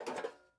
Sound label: pickup metal sheet; Rust